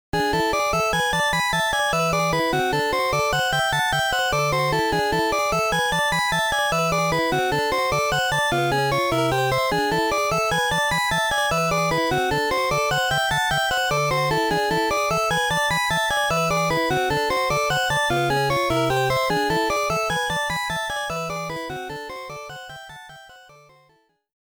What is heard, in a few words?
Meteor Shower BGM; Nintendo Sound History Series Disk System Rare Selection